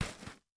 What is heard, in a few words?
footsteps soft sole single step right on dirt ground